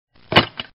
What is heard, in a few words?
hangup